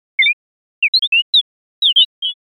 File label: chirp mix